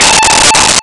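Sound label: Over Drive Guitar A5; Nintendo; July 2020 Gigaleak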